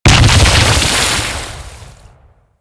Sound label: Pirate Cannon Explode; Warframe; Warframes